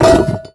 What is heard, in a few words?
weapon drop heavy